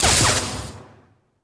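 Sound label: lightsaber hit high; weapons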